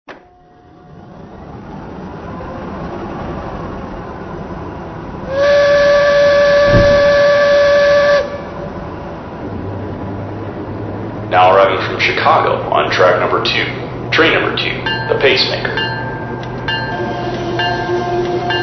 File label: P2 BoxCab